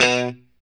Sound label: POP B 2, STRAT guitar; Spectrasonics; Hans Zimmer Guitars VOL-1; JC-STRAT POP